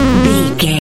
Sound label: retro damage hurt ouch